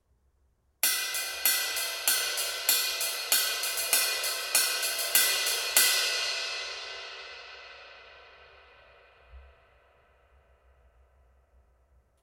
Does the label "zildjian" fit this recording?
Yes